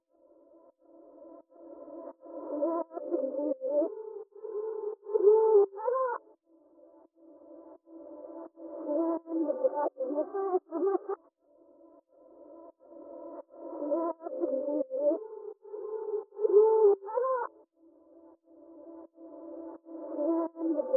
170 BPM C Min Reversed Vocals; Loop Stems; Cymatics; Utopia

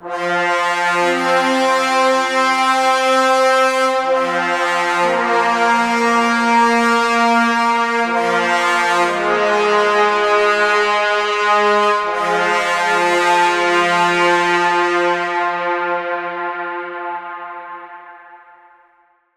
Brass - Solo Brass - Fm 60Bpm; Ghosthack; SkyRealm

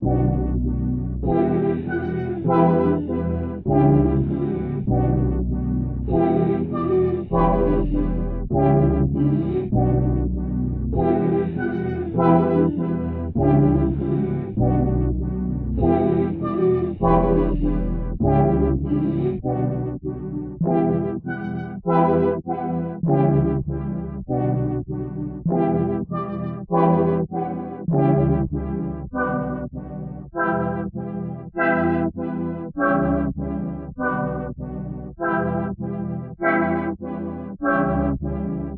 99 BPM B Min, Melody; Cymatics; 2022 Melody Collection

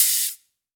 Pop Hihat, Open; Drums; One Shots; Cymbals; Hihats - Open; Cymatics; EDM Starter Pack

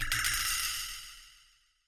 twangy metal noise delay

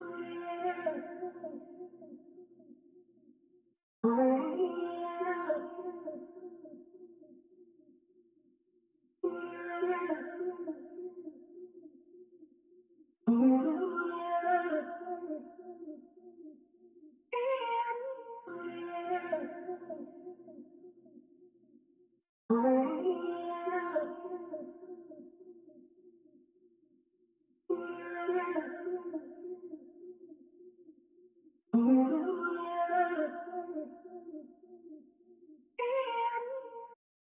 104 BPM F Min Vocal, Latin; Loop Stems; Cymatics; Otro Dia

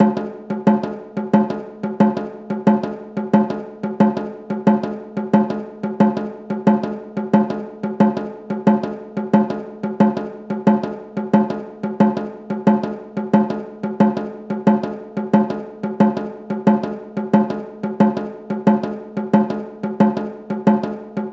Tablas, 90BPM, Percussion; Percussion Kits; STEMS; Ghosthack; SkyRealm; Kit 10 - Peace Treaty

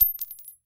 Bullet Shells Drop; Fairlight Sound Lib

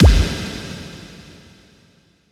Impact

